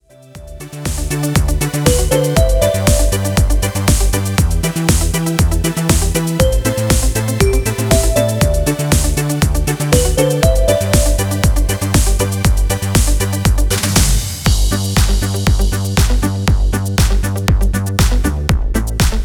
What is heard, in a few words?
Disco